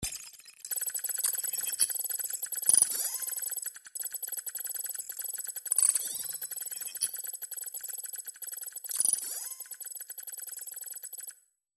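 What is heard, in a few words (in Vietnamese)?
Âm thanh ào liên tục để nhập văn bản điện tử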